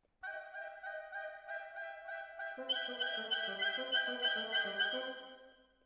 Cello Solo

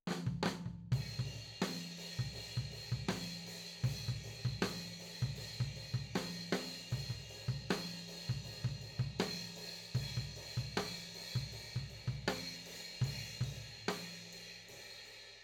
FLOOR

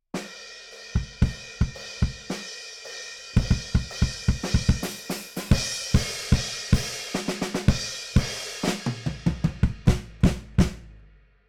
BD Out

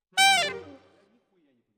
Alto, RNR for piano

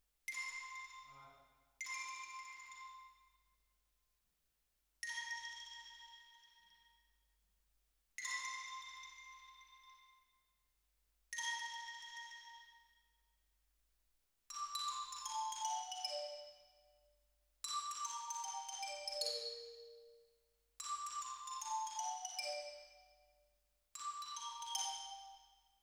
Xylo

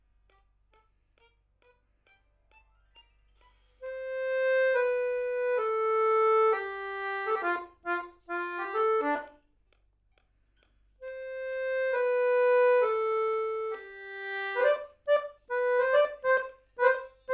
Accordeon MAIN

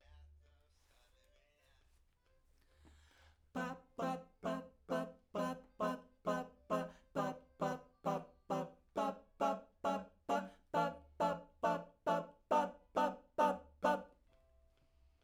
Backs SCHOEPS

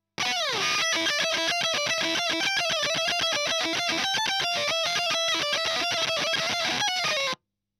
EL Fuzz End

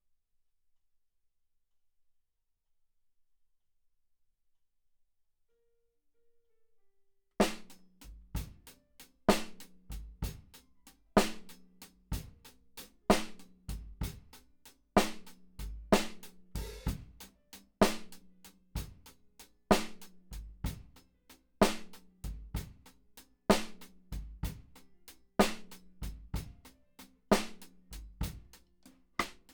Kit

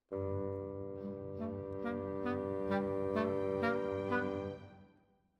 Clarinet

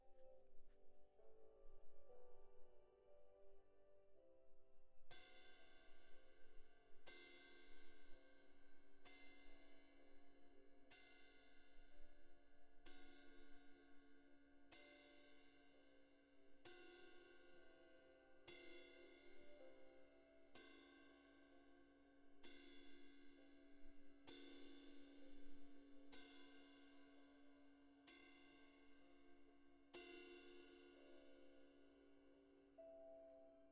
Cymb Close

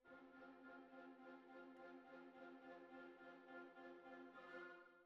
Cbasses

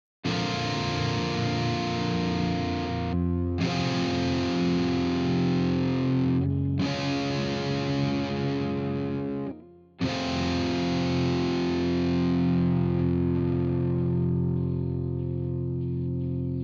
Guitar

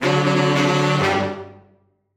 SAXES

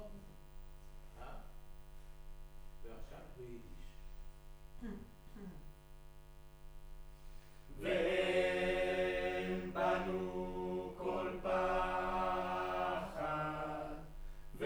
Room, Choir

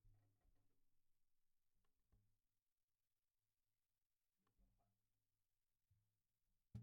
SNR Bot

